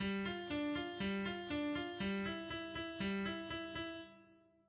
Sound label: Alberti bass; orpheus